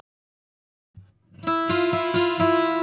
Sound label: spooky picks e; cryptology